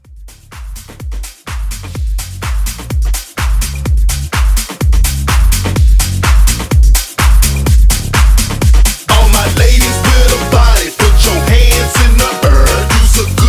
Club Hype

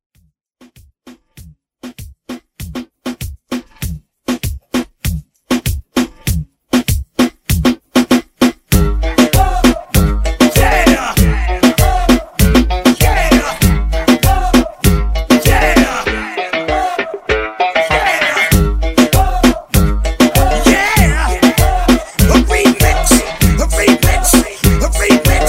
Reggaeton Hype

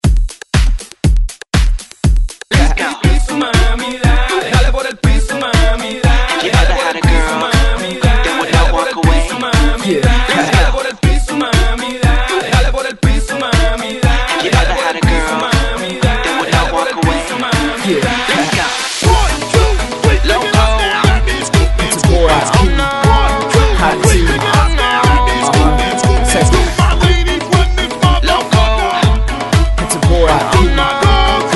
Hype ReDrum 120Bpm; DJDOLLS

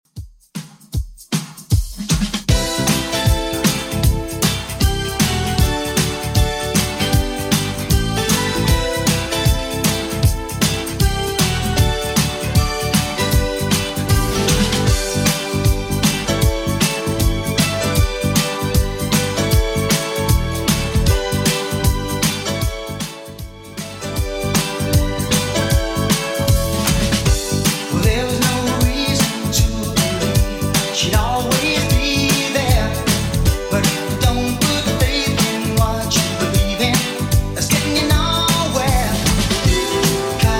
80s Redrum 156bpm; remixplanet

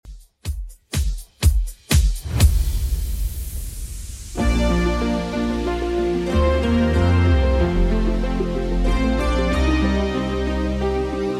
80s Redrum 123bpm

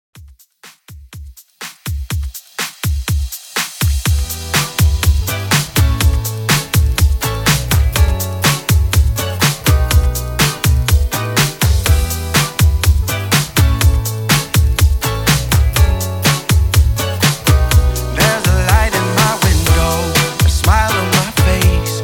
latin redrum 123 bpm

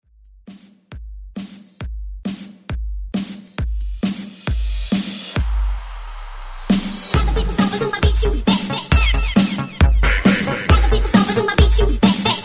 Mashup 135 BPM; Redrum

